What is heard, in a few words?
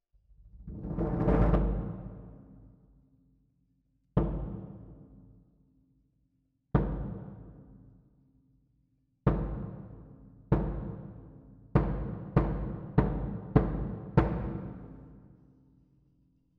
Jazz for piano